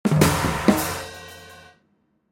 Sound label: Combo